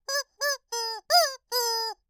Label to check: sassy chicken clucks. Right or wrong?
Right